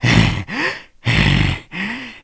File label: Huff; Sturgeon; Zelda